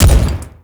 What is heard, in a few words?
Ghoul Devourer Step Default, Footsteps; Warframe; Grineer Ghouls